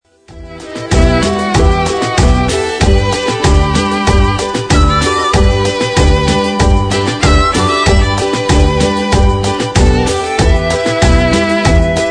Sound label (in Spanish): Cumbia